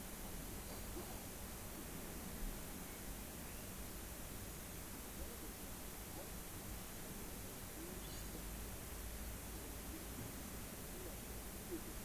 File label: rayol farm; SEAMED